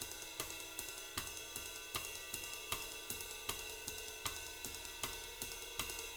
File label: 310 BEBOP, Living Drums